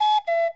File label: cuckoo